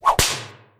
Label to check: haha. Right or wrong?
Wrong